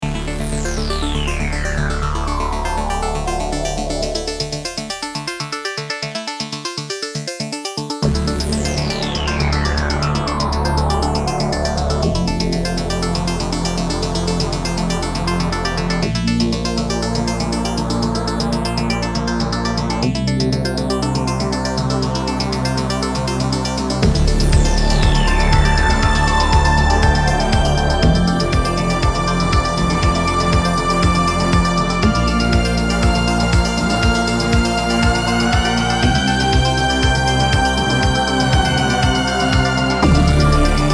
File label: synth expander mod